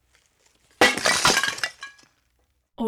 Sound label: pottery breaks